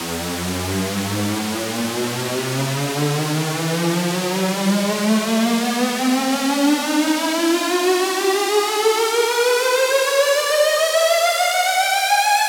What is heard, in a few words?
Square E 8 Bars (Higher)